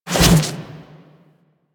Melee Jump Slam Large Charge, weapons melee whooshes; Warframe